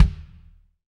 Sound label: BD, Roland; Sound & Vision - Gigapack I